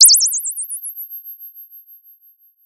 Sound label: Sound of a bird tweeting nicely